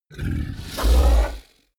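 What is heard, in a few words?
fishman snort breath, creatures; kreaturen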